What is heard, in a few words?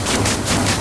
machine clean brushes loop